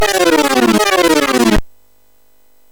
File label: Sweep, MOS6581R3